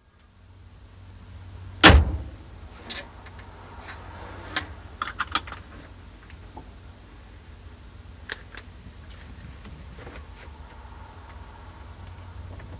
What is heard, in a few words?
R4 Leaving Park, Renault 4